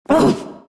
broo pain; broodmother

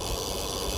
wheel spin; ui; economy

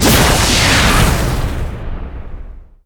netherblast; dota; pugna

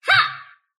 sylph attack short; dota; dark willow

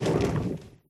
wings; rathian; dota; mh event

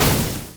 range projectile impact; dota; creep

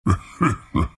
treant laugh; dota

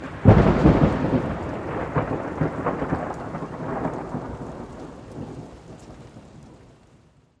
lightning

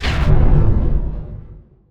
trigger trap; templar assassin

